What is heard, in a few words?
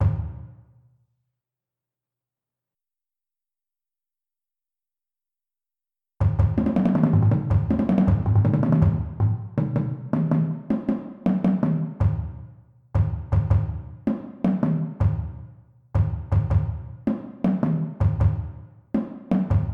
bass